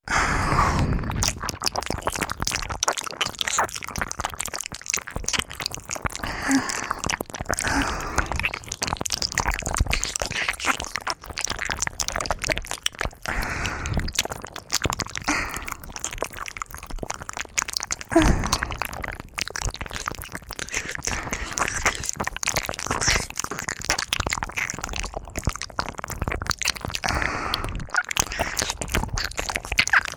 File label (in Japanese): 耳舐め のみ 両耳用